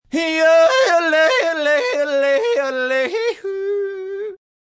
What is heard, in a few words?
Yodelling, male, cracking, descending,, funny, comedic, cartoon (human voice); Funny Sounds; comedy